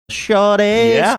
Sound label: singing